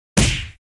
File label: hit punch; Удар; Звуки удара; Звуки удара для Форсакен